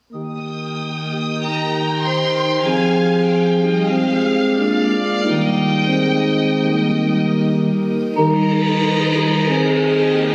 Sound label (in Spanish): Kyrie 2 Voces; G.Rossini